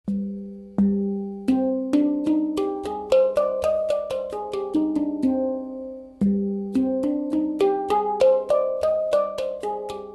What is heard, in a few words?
Hang drum